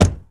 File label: Truck Ram Diesel Door Close; Trucks